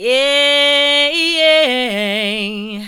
"yeah yaey", Female Vocals; PlatinumPhattCD2; E-MU Producer Series Vol. 8 – Platinum Phatt

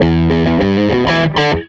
SG Guitar Loop

